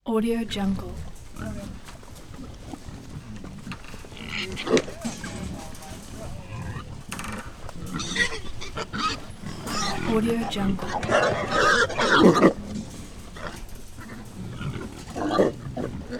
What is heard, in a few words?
country livestock pigs; shining-wolf